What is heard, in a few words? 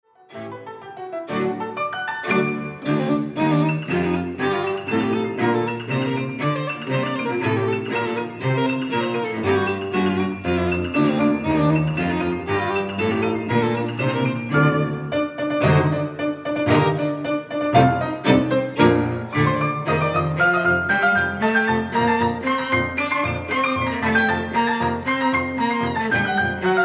March and Dances, PIANO TRIO SUITE FOR VARIETY ORCHESTRA; SHOSTAKOVICH